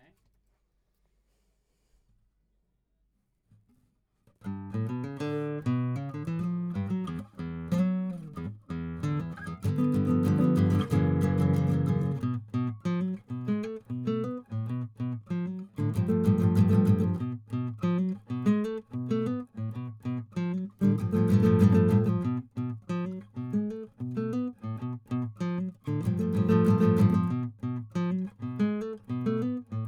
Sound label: GTR